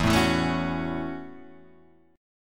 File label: F sharp Minor 7th